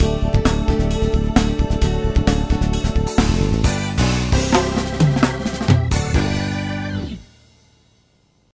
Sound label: Win; Minicar Champion: Circuit Race; Android